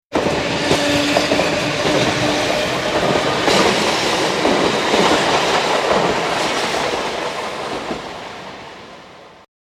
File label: Train; Effetti sonori